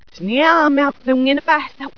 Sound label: chant